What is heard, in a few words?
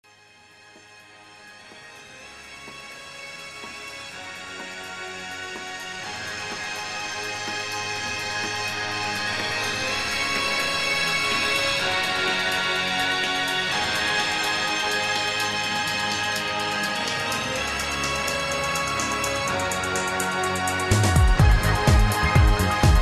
Disco Strike back; musique electronique